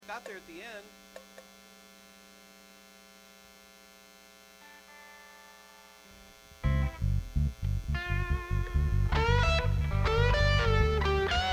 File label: Buzz and Feedback Mix